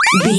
retro collect pickup item

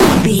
retro impact hit

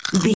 comedy bite chew

